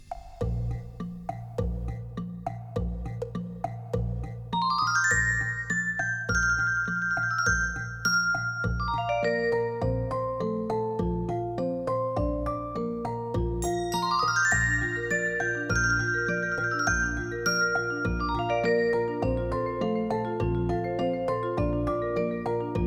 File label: Tea Chinese Dance Instrumental; background music